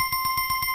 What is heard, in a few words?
coin Count Loop; hiddencoinsofegypt